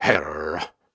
Grunts and Chants, Viking Vocal

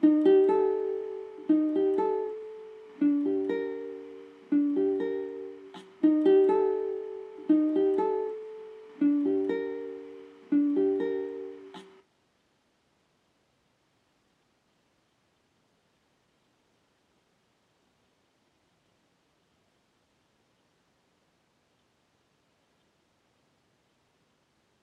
80 BPM G Min Main Guitar; Loop Stems; Cymatics; Harmony

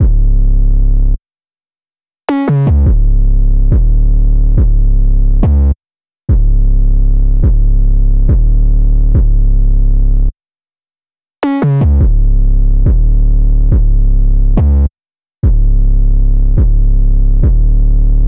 105 BPM C# Min 808; Loop Stems; Cymatics; Go To War

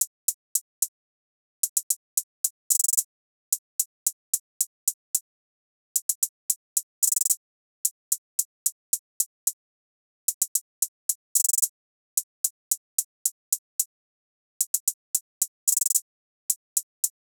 Drum Loop, 111 BPM Hihat, RnB; Loop Stems; Forever

